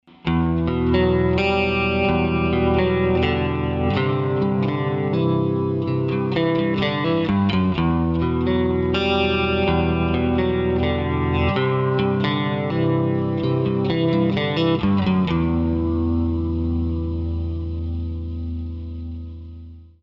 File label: Roland JC-120